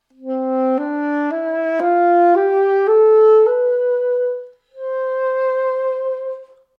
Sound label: C Major Scale